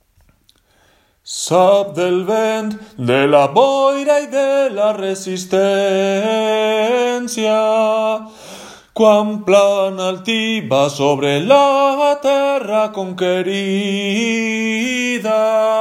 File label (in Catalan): Cançó de mar BAJOS